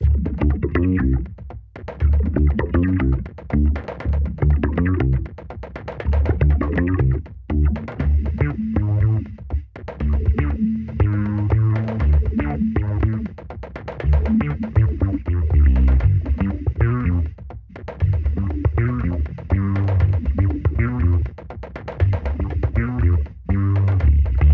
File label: Music Bee Bass